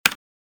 keyboard typing; ritz

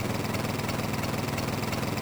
drive; gopher-kart

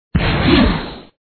ice; btd4

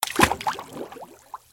watersplash, drowning

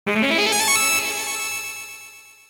discovery sound; ritz

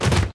tackle; retro-bowl

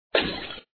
Ceramic; btd4

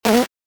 double Jump; ritz

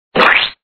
splat; btd4

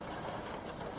move, tanks; mathsisfun

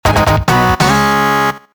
start jingle; ritz

